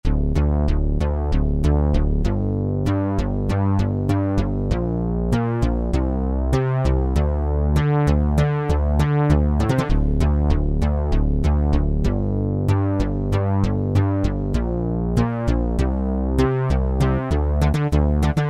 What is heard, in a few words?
Resonant Synth, Korg Wavestation A-D